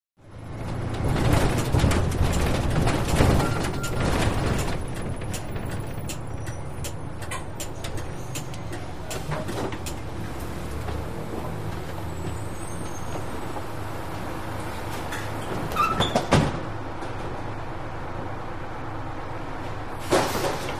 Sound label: Interior Empty Bus Ri